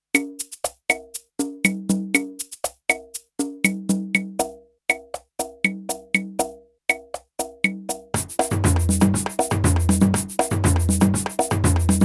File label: R8 Percussions